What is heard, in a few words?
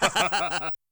Ha Ho Hee